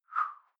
whoosh simple short tonal high

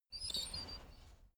dog teeth chatter squeak; natur-tiere; hunde

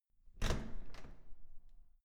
door open

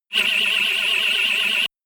shot buzzing wavy tooth; zaps buzzes

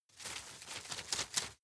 grab shake slow medium; berufe; foerster

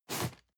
cardboard box medium slide short hard; objekte

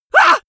male painful shout short; vocals; comedy-cartoon; soundpool

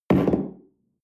espresso place tablecloth slide wobble

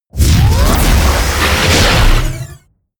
sci-fi construction construction complete; hits impacts

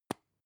lipstick open soft; haus-garten; badezimmer

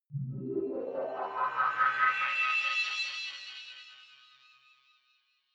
energy generator start settle

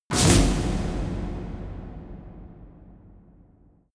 reverby snare impact

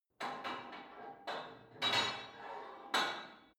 put weight onto barbell unsteady reverby

